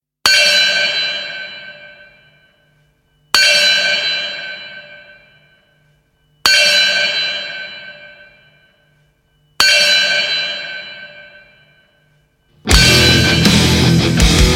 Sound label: metal hammer; rolling bones; rolling thunder